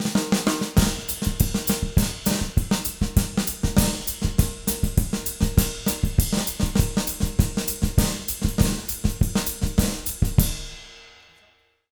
200 SAMBA, Jazz Latin Drumloops; USB Soundscan vol.08; AKAI

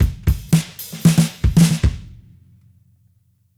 Disco Drums Ending, 70s Analog Funk; Sonic Foundry (Sony Creative Software); What it Is! 70s Analog Funk

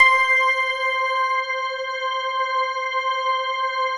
PAD, DIGI-PADS

